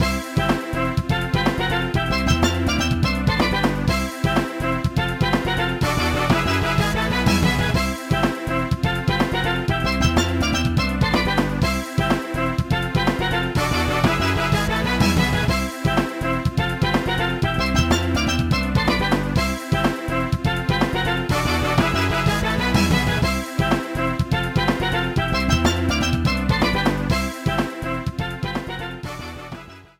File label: Tournament Victory Theme